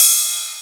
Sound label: Ride Cymbal